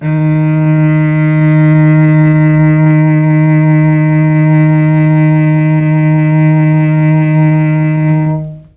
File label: D#3 300 CELL, Mellotron, STRINGS; Mike Pinder - Mellotron Archive CD